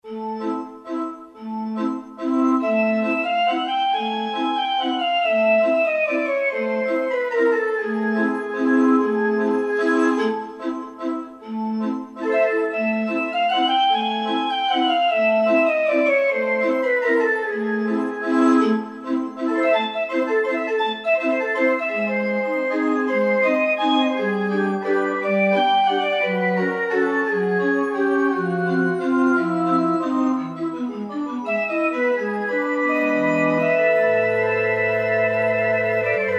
Orgatoron, Roland JD-990; Factory Patches

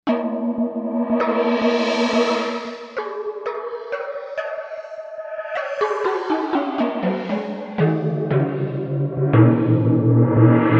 Synth Gong, Korg M1Rex; Factory Patches

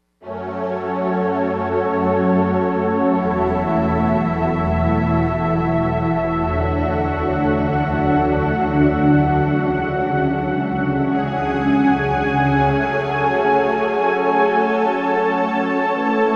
Digital Choir

